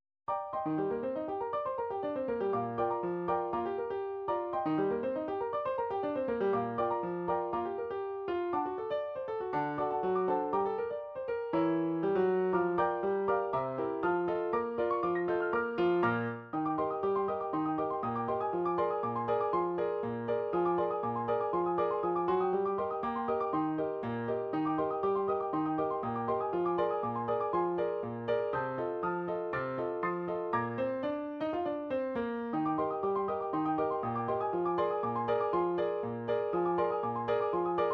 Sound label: oldies ragtime